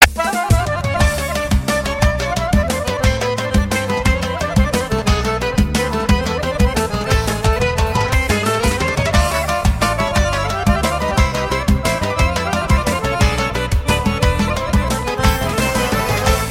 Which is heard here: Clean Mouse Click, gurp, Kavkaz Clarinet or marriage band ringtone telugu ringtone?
Kavkaz Clarinet